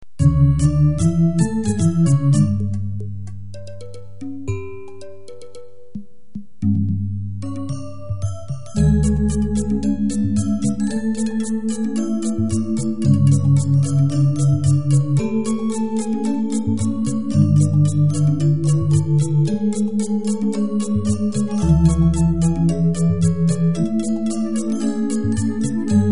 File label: degung